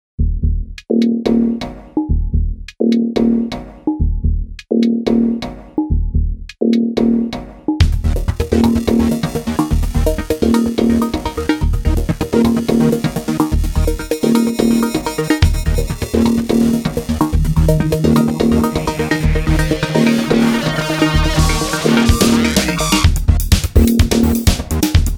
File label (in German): trailermusik; video-visionen